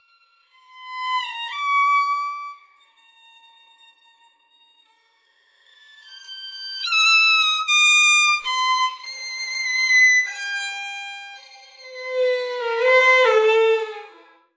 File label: string instrument